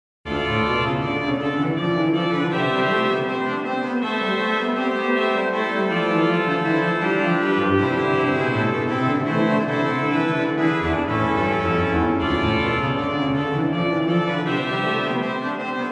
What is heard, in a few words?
Noel, Organ Music, Souvigny; Boely